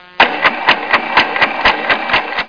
windshield wipers

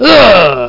UGH; Game Pack 2 1995 Gold Medallion CD; shoot

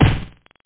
bounce; Boink; Gamelication

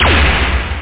Shield

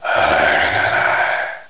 growl; skullwiz

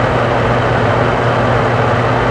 air cond; forsaken

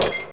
LADDER; PC Gamer IT CD 38; thief

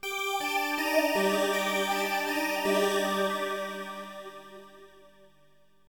SYNTH PAD AMBIENT 160 C, Ambient; eJay; Sphere

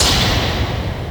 roll

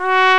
Trumpet "C" F#4; Indiana Jones; iMuse

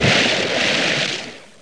WATER; SRALLY

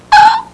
pain uh; People; Sound Library 2000; Wayzata Technology

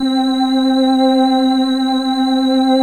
space voice, synth pad; gmplay

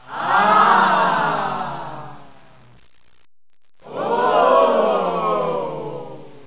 Crowd Oh & Ah; How Would You Survive (1995); Grolier

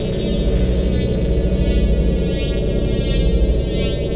powerup loop; Earth and Beyond; EA Games

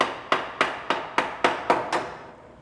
HAMMER; SGI O2 Out of Box Experience 2.2

